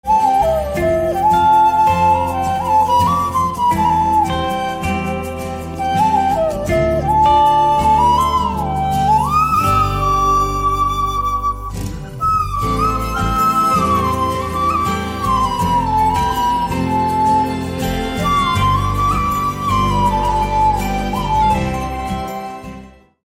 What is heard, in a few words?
flute instrumental ringtone